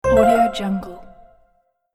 notification element alert

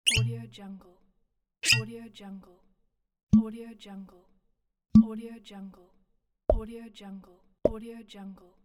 sounds of uncorking

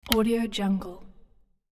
light switch lamp quicker medium pitched

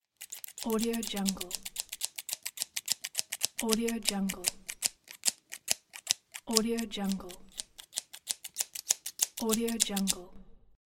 scissors clipping fast

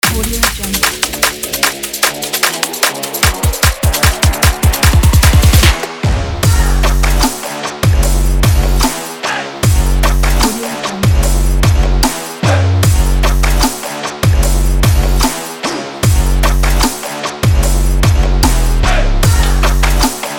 rap dynamic

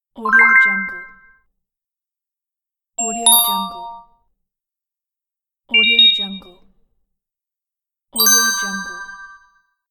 blinking star gamefx

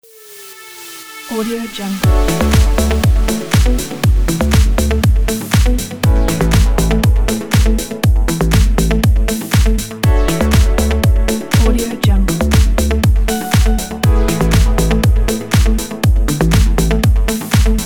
fashion corporate

